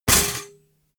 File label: toaster finished